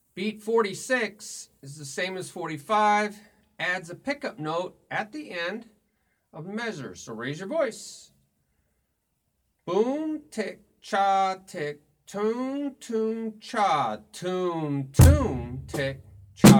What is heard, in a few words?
Beat Toom Ti Cha Ti Toom Toom Cha Toom